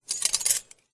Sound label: metal silverware gear twist gear open slide texture; ancient game